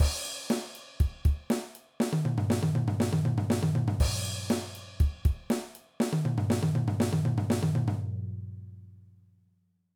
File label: Fill, drum